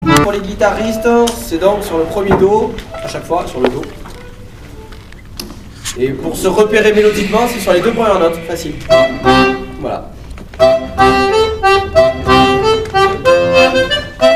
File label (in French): Guitare polka; Finish set; Musique d-ensemble